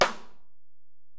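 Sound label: Acoustic Snare, Alesis DM-PRO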